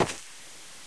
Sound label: dirt; echoes